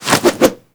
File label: Manic Tackle End Foley; Warframe; Grineer